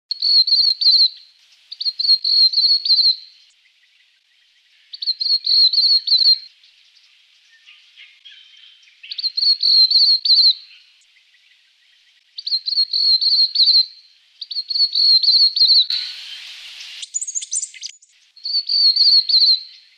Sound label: scarlet Robin